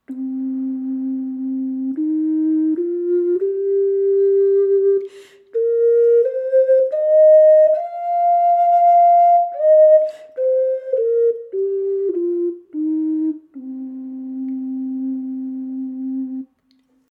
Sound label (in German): Indianisch Bass C, ocarina; Indianische Ocarina